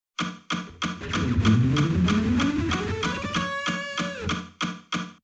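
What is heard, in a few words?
economy picking fast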